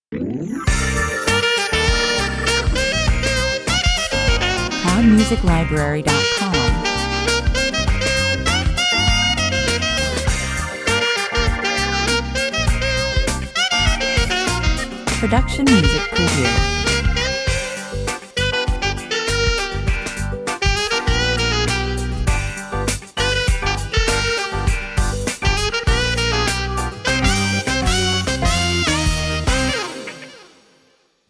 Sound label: funky funky 100 bpm